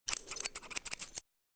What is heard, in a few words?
barber; wild west; top chop